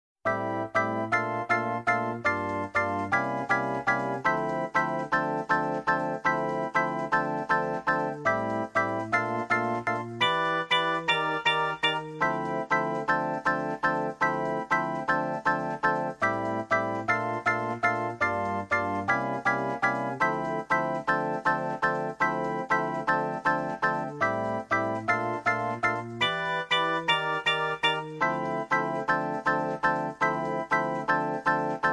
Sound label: instrumental